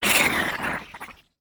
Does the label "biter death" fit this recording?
Yes